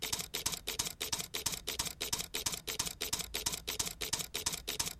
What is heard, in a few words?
silent, canon eos 5d mk iii